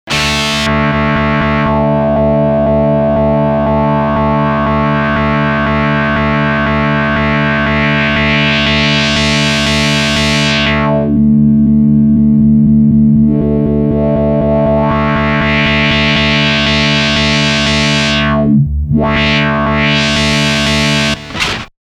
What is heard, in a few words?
Kaoss